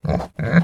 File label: ambient